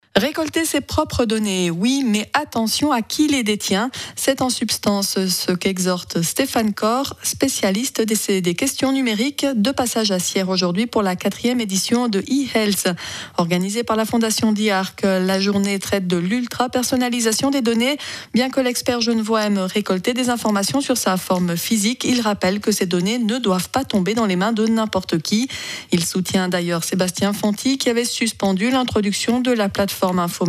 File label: midi